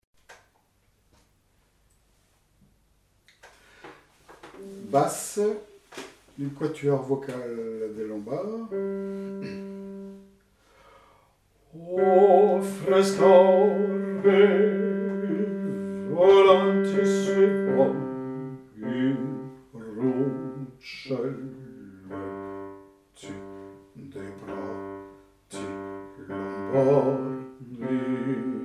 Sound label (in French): lombard basses